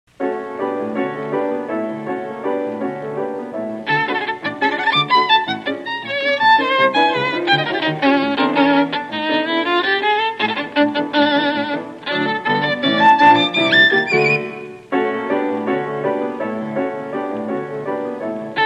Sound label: suite violin piano